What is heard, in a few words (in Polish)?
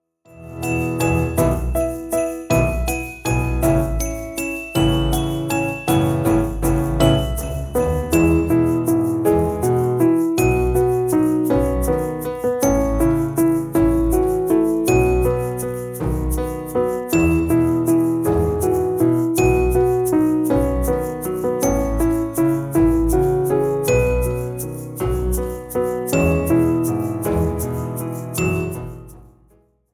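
choinka podkład instrumentalny fragment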